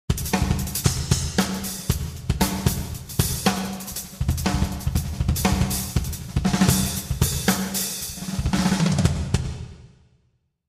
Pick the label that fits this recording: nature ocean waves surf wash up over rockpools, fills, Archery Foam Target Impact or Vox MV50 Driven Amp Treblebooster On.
fills